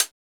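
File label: hi hat; E-Lab; X Static Goldmine 1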